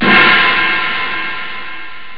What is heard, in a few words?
crash